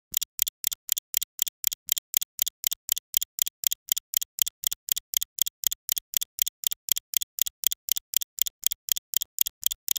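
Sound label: a ticking clock high speed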